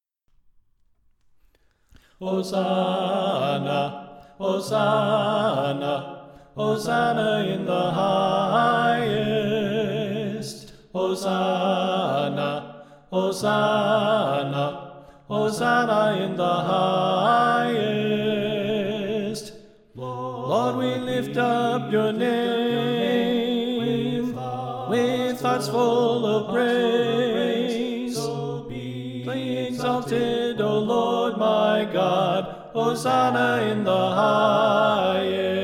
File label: Soprano Left